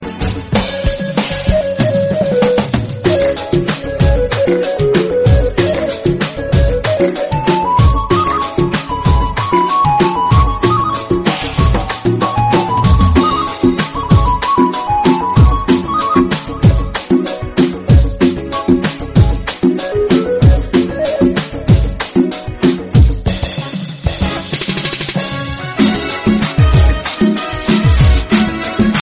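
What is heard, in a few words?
algerian instrumental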